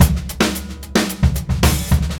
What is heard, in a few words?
DRUM 110